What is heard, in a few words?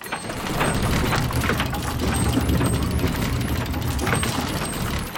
reelSpin; riches of midgard